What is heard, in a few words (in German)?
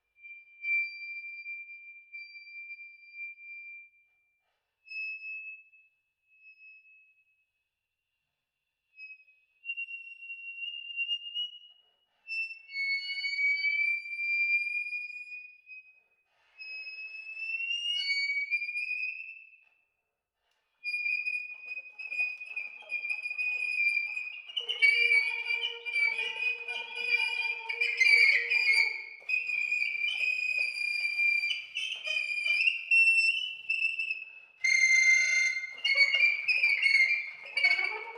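sop, Zahntöne, Gehaltene Töne leise, Glissandi; Spiel mit Klappen, dann gemischt mit Stimme, Flatterzunge und Doppelzunge. Sopran; saxophon; spieltechniken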